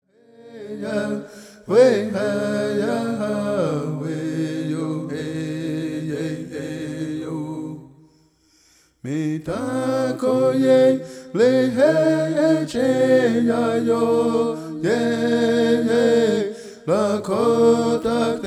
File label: Honor Song